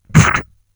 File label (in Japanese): zuburi; ズブリ; ズブリと刺すようなゲシッと蹴るような？; ホラーボイス・声ネタ・効果音・ＢＧＭ